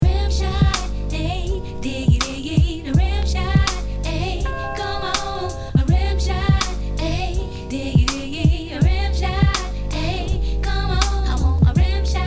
RIMSHOT

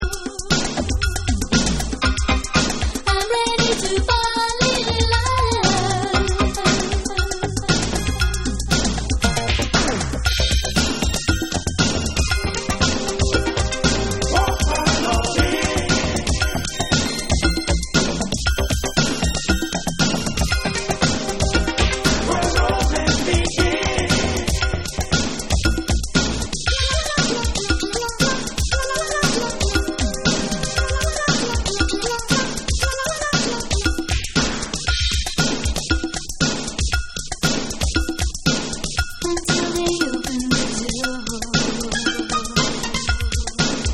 Club Instrumental

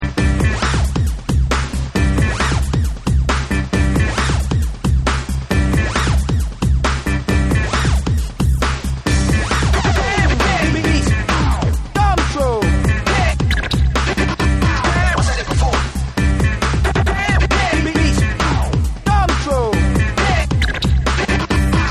SINEWAVE

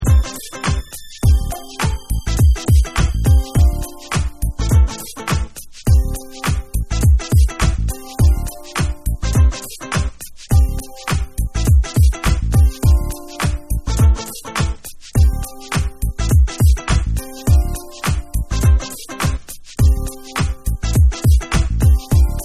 I LIKE Instrumental

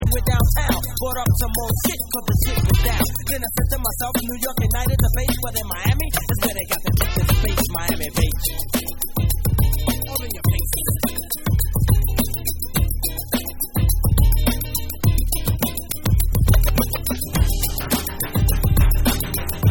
MIAMI BASS Club Remix

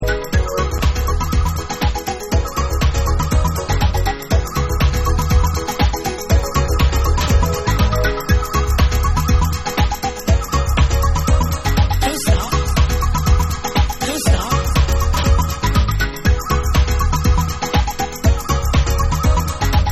Piano Groove

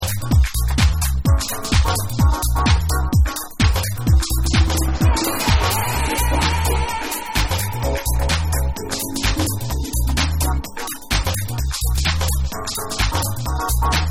DEEP FUNK

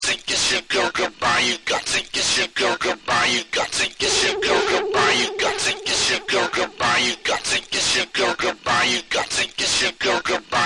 Acappella

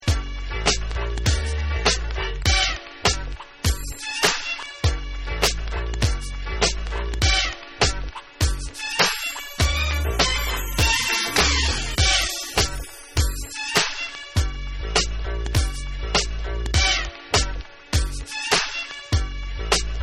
Instrumental

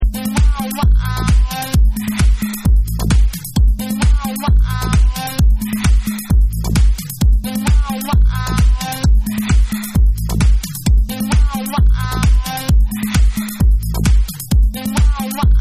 BASS, Tribal, Dub

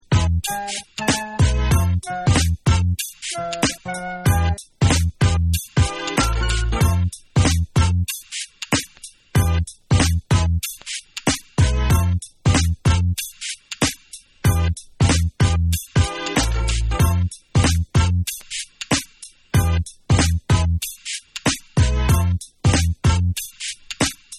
Instrumental

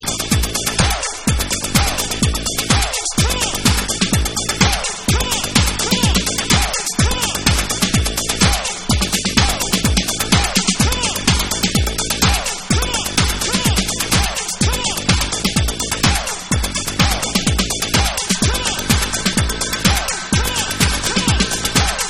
TECHNO TRANCE

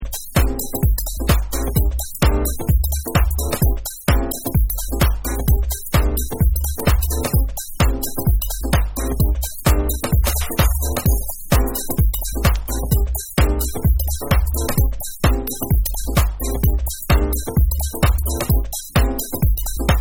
Instrumental Mix

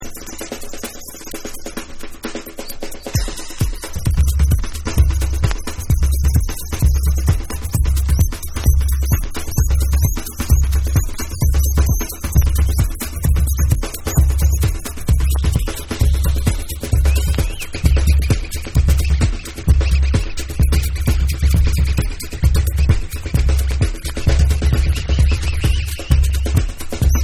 Drumz

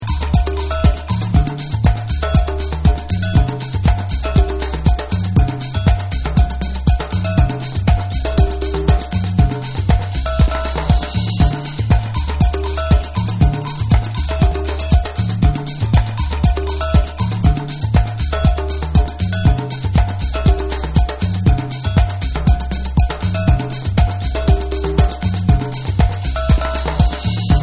Guitar Mix

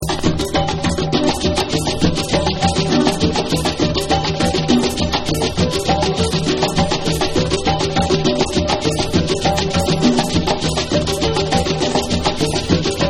DEEP IN AFRICA Afro

